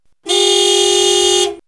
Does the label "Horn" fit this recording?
Yes